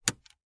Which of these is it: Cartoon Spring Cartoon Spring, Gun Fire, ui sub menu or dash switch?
dash switch